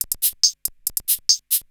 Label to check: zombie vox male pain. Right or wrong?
Wrong